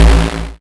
YM Bass Am, chip; CHIPSHOP HITS

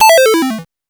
ChipShop Arp Am; CHIPSHOP HITS; ARPS; CHIPSHOP DELUXE

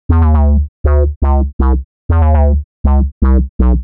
Tech Bass 120 E, techno; musicradar; 120bpm; Bassline

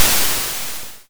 Snare, YM, CHIPSHOP HITS DRUMS; ChipShop Deluxe

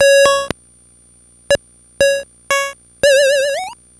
Synth C#, 120 BPM; CHIPSHOP DELUXE; CHIPSHOP LOOPS

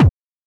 Kick, techno drum

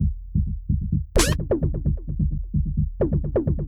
Beat 130BPM (Perc), techno; techno drum fx

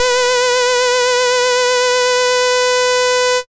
SID lead B; ChipShop Deluxe; ChipShop Hits

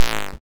YM Perc, DRUMS; ChipShop Deluxe; ChipShop Hits

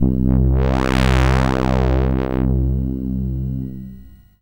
SID Bass C; CHIPSHOP HITS

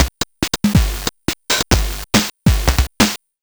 GB Drums, 140 BPM; loops; CHIPSHOP DELUXE

